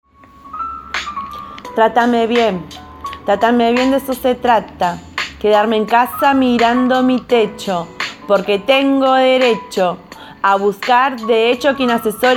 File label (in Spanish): Rap